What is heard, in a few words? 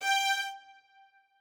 strings